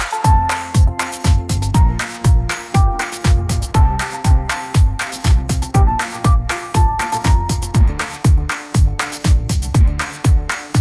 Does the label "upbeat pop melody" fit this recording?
Yes